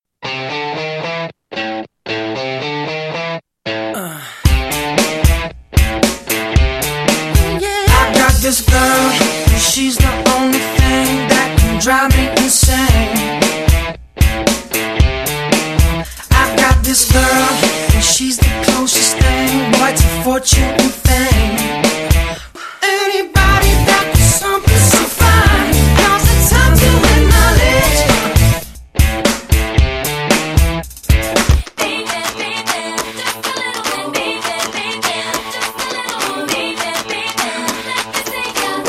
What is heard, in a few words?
audition music, ballroom